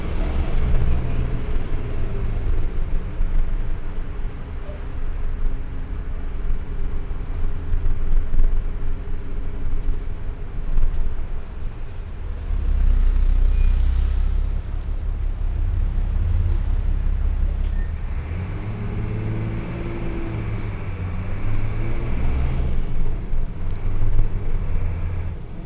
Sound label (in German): im bus, Brasilien; Fieldrecordings